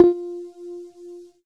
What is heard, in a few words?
ping, synths; Notes